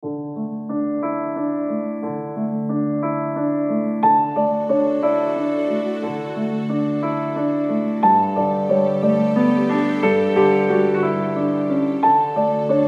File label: inspiring cinematic uplifting piano